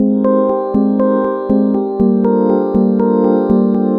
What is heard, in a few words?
Porcelain, Bells & Tines; Sonic Foundry; The Electronic Music Manuscript Collection